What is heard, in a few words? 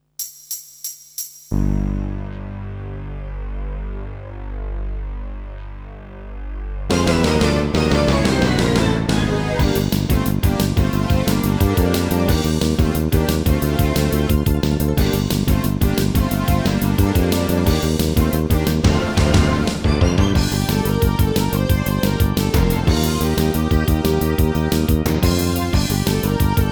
Synthesizer Version; Super Famicom Magazine Vol.10; Jushin ''Thunder'' Liger